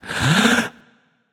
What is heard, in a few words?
nv breath; smite; voicepack; ganesha